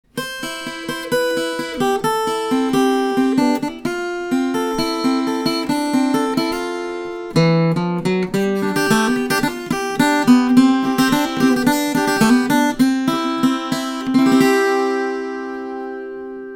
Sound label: Nash Acoustic, Nashville Tuning